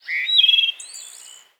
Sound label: bird random; Level4 Hidden World